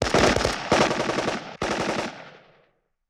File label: Machine Gun Multiple Short; Weapons & Firearms; Sony Pictures Sound Effects Series Volumes 1-10; Sony Creative Software